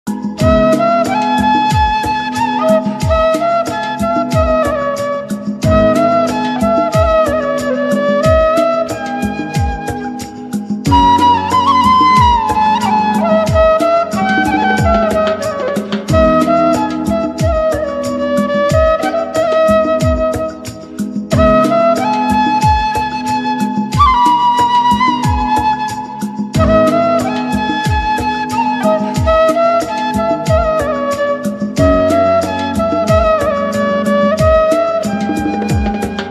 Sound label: flute ringtone, heart touching ringtone